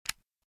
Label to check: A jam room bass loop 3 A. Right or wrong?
Wrong